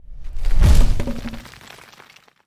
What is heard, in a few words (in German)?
Saule Fall; Hierbei wird beabsichtigt, dass der Spieler das pulverisieren des Materials mitbekommt